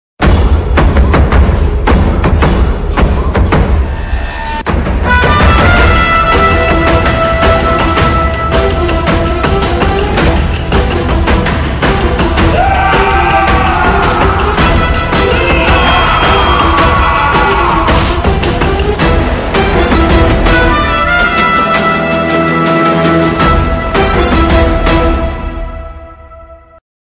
trailer bgm ringtone fight bgm ringtone telugu bgm ringtones; narappa; venkatest